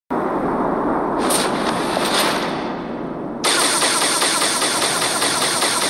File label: Shade Turret; Halo 3